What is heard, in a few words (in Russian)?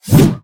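короткий глухой звук заточки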